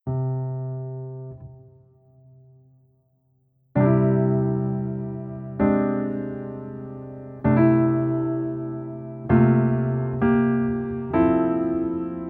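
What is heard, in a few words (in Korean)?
Piano version -1키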